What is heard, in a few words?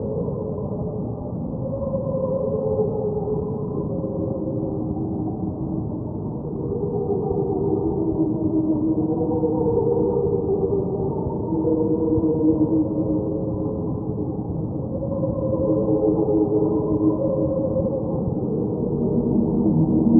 cricket lowpass 1k